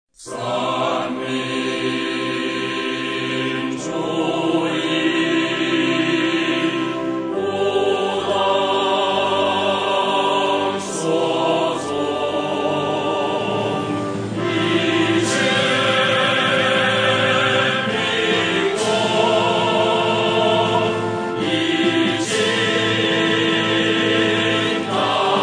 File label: chinese song